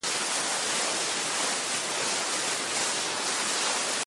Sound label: Snow loop High; iStunt 2